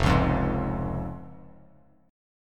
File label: F Minor Sharp 5th, Bass Standard, chord; 1,4,3,1; Fm#5 strummed; JGuitar